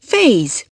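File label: phase, techno bass drum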